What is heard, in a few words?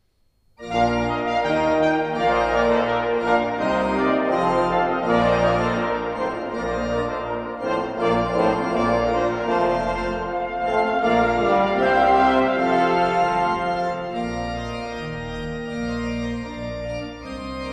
Trumpet Finale